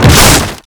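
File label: wood crate break medium; Explosions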